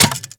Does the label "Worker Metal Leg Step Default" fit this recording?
Yes